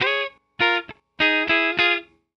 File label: 101 Pop Guitar Fill Db, electric; Pop Rock